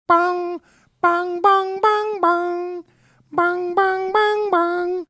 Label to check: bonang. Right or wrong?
Wrong